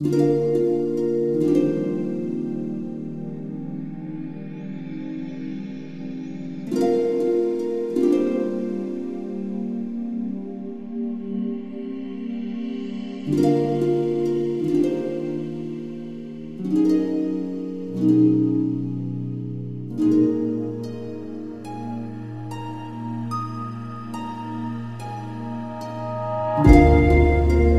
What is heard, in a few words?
Ship Sad Area 72 4-4